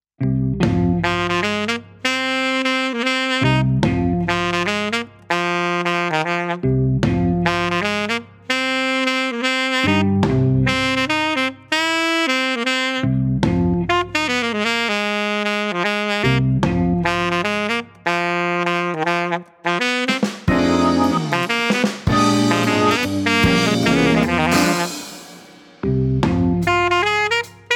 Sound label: Cryo alto work song